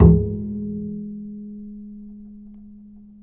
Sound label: G#3, bass, acoustic fx; Spectrasonics; Bass Legends